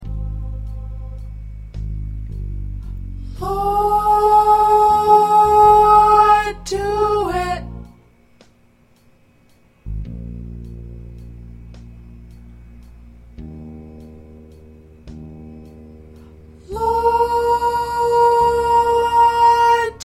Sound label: Alto